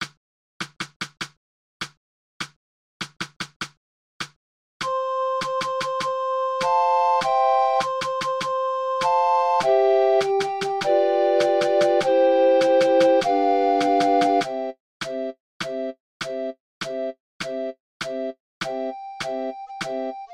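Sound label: battle hymn medium with drum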